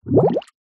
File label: nature water bubbles rise surface small fast